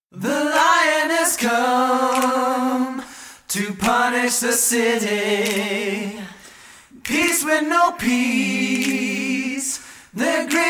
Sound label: Blues